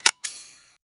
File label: soft camera flash sound; charge